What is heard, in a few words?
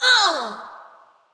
Creature Wound Light; Fjola